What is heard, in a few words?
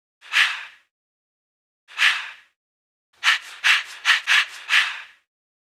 Ha Ha Wisper; Sonic Foundry (Sony Creative Software); Club Joints and Street Anthems